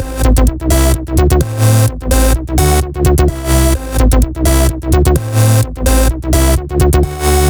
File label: Melo 128BPM; Mini Kits